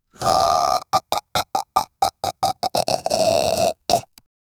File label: grunts of effort